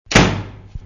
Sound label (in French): battement porte